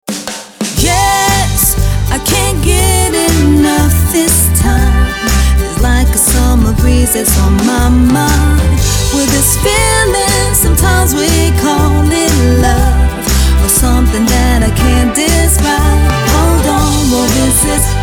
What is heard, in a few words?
SONG